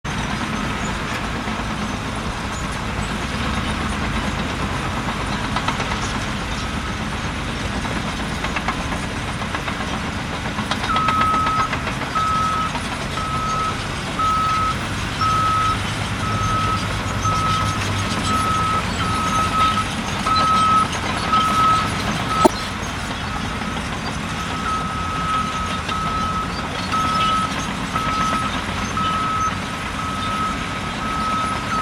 Construction Site; Blastwave FX

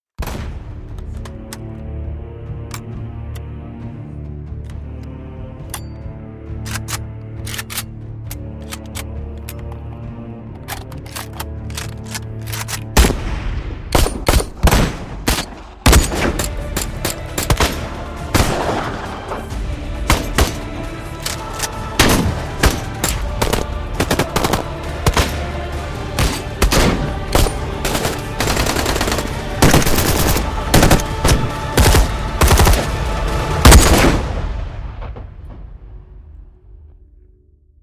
Guns Construction Kit; BOOM Library

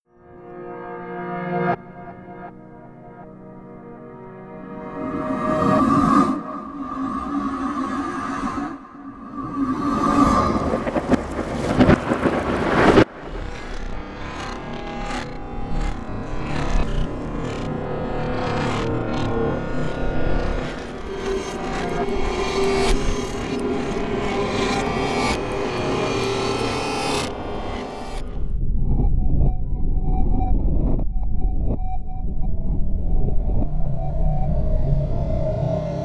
Reverse Production Elements; MatiasMac SD

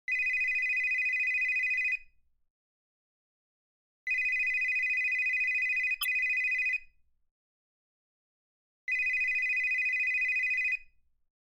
Traditional Telephones; Blastwave FX

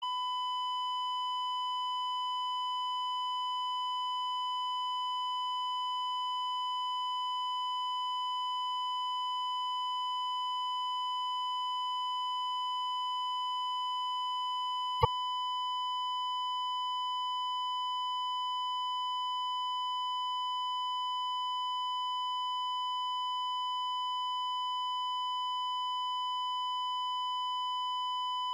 Medical; Blastwave FX

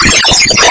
Cosmic Sea; Soundtrack Loops

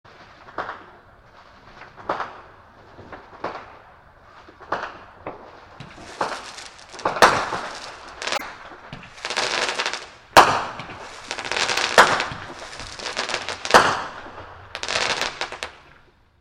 Fireworks; Big Room Sound

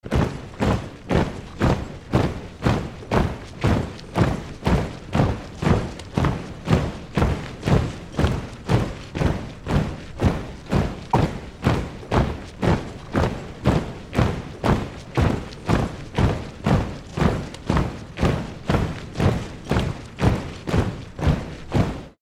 Feet; PSE The Producers Library Feet Vol. 1

